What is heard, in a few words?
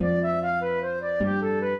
trio, flute harp; Mozart